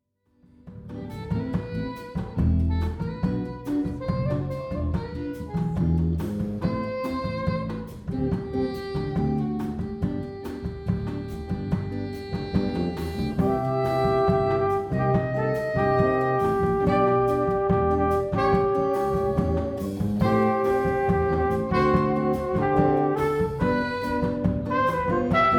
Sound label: sunset bossa